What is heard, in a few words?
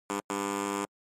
Quiz Buzzer Multi; クイズ ブザー